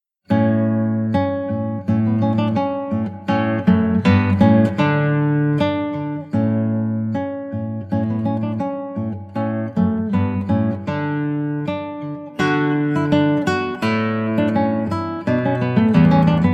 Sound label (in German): der fuggerin tanz; melchior neusidler